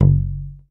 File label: YAMAHA5 MUTE F#1, Bass